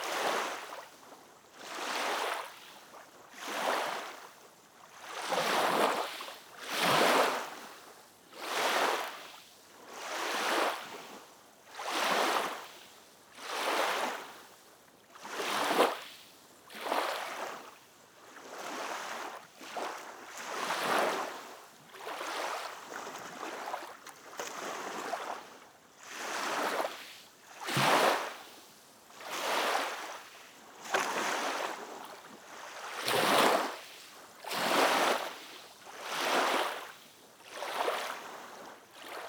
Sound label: lake waves lapping gentle loop; Hydrology Streams, Rivers And Lakes